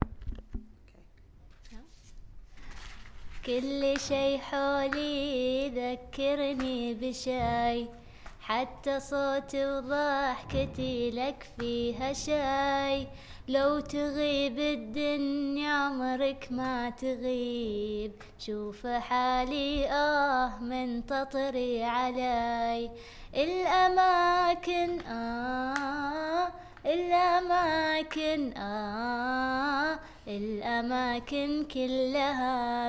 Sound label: Arabic Song